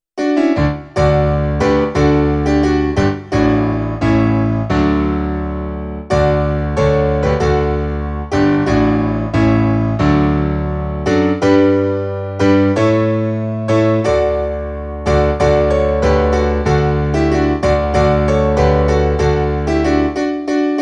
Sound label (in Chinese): 普世歡騰歌 Piano; LKY School Choir 梁季彜合唱團